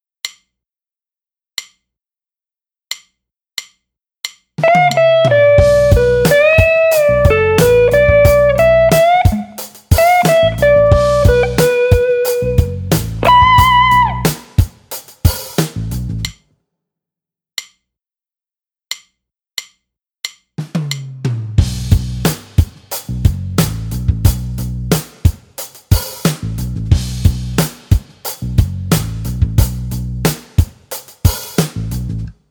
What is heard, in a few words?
Build Up